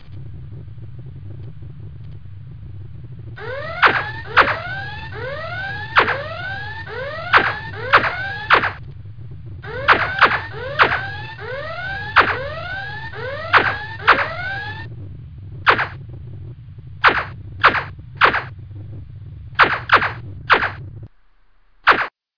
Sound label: Star Ship Engine Sound Red Alert Firing Fazers